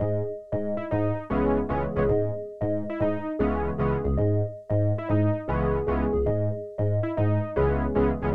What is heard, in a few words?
All Synths